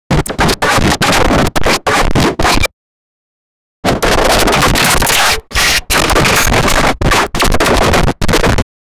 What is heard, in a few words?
intense reali, emotional